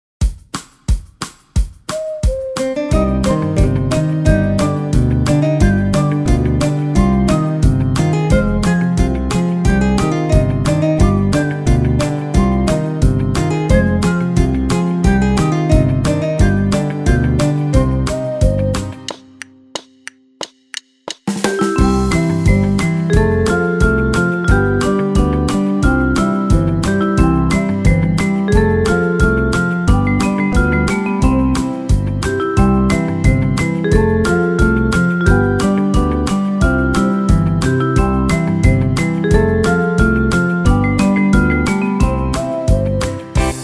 instrumental

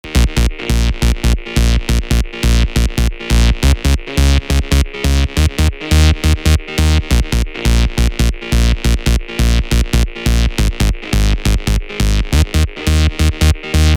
Trance Bass A#

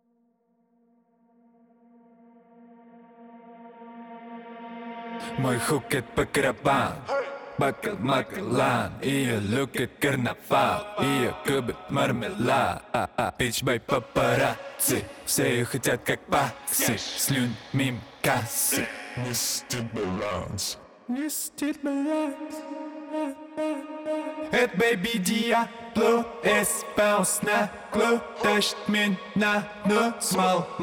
ACAPELLA

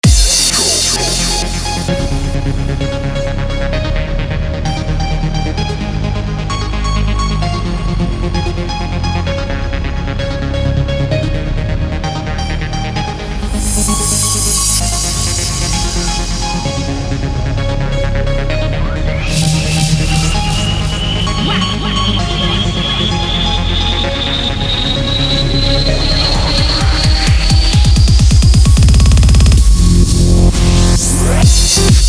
Electro

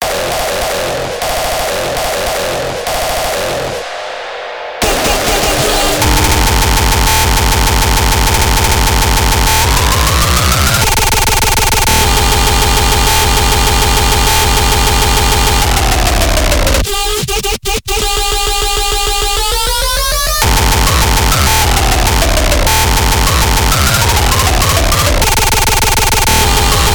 400 BPM

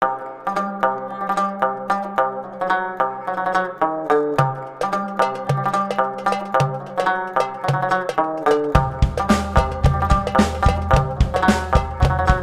Arabian

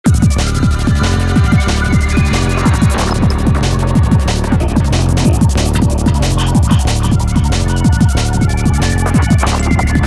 dark psychedelic dnb 185 bmp; разминка

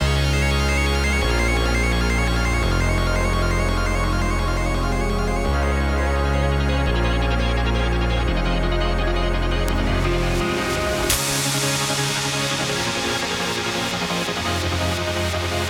Wave, DNB

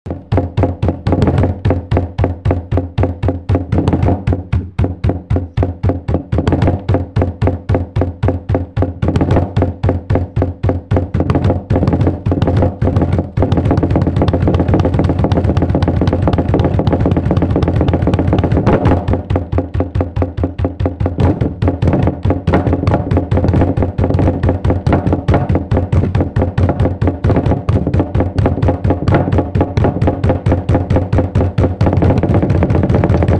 table drums

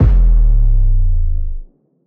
Sub Drop

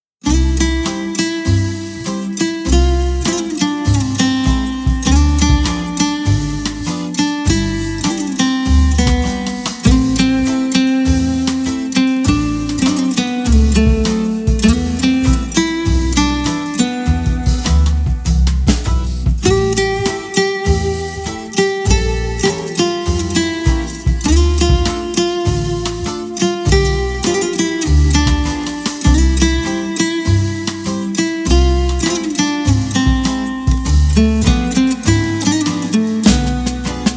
Folk Faller Remix

